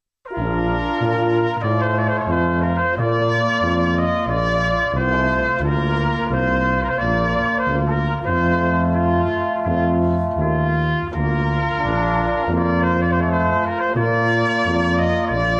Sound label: trumpet voluntary